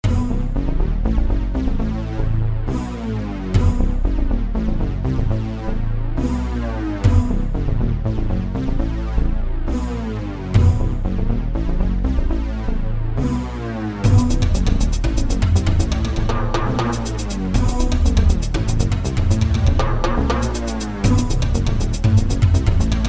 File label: tribal beat